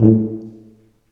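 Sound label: brass tuba C, staccato; Solo Brass; Roland LCDP12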